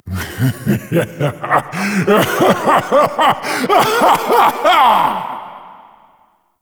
Vagabond Laugh; Warframe; Duviri; Easter Eggs

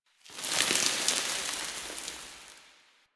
Small Dust Rock Slides One Off; Warframe; NineteenNinetyNine

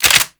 swarm rifle reload; Warframe; Infested; Shock Swarm Rifle

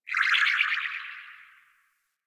Ship Tech Chirps; Ambience; Warframe; Corpus Ship Remaster

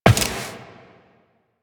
Step Glass, Footsteps, Walk; Warframe; Tenno

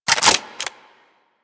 Clip Out, shotgun; DE; Warframe; Weapons; Strun

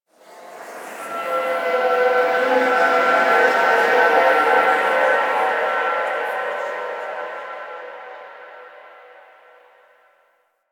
Ampthitheatre Echoes; Warframe; Quests; The New War; Ambience; Zariman

